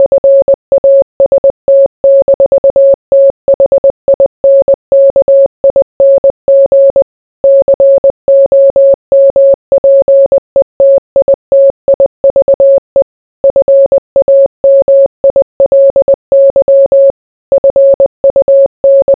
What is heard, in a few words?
Morse Code